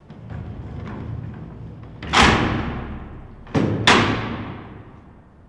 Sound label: Jail Door Roll Shut; jail & prison doors